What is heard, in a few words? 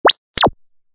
electric wire short message tone ringtone sms